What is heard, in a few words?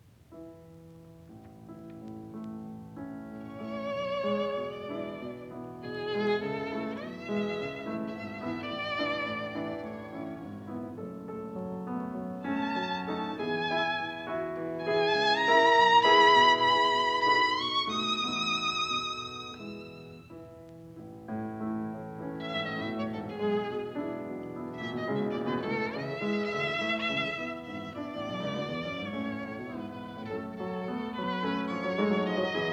Sonata for Piano and Violin in D major, Variation; Violin Sonata No 1; Beethoven